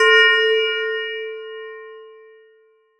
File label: bell A4; osu